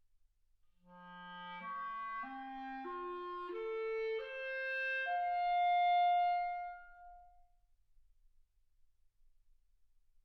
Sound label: clarinet